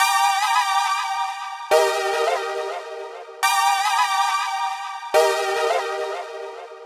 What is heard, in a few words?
140BPM G; Vengeance - Essential Clubsounds Vol.4